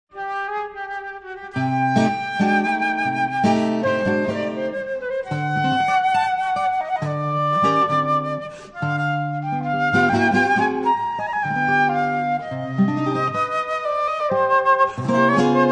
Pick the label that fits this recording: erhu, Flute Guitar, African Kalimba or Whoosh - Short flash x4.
Flute Guitar